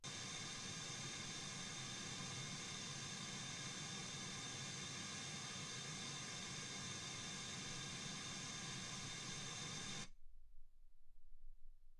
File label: wgn